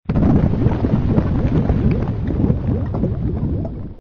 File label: vulcano eruption